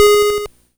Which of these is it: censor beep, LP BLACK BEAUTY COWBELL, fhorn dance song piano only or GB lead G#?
GB lead G#